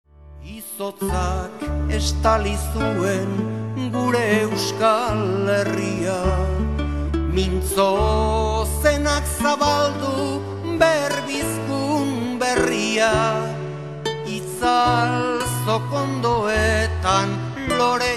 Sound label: Euskara Basque; Lizardi